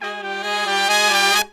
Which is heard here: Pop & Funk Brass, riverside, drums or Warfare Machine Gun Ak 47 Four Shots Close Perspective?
Pop & Funk Brass